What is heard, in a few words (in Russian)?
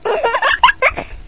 hihikane